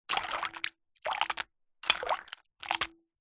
cereal in milk in ceramic bowl spoon in lift and drop back in splatter; food; zapsplat